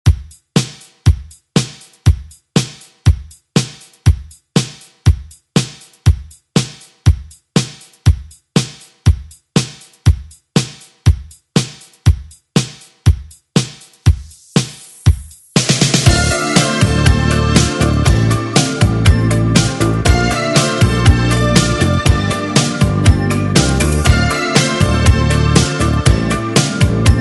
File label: 80s ReDrum